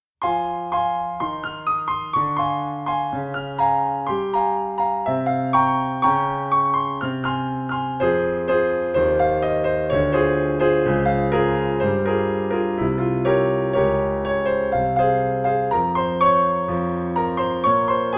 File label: piano